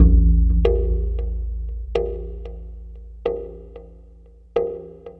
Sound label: Tribal Wave Drum; Sonic Foundry (Sony Creative Software); Ma Ja Le Ethereal Textures; Worldly Rhythms